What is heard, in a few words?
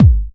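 BD Trancy, bass drum; Club; VEC1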